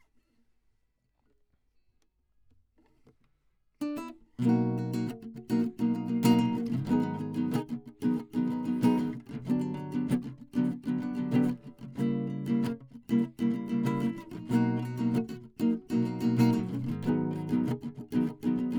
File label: Classic Guitar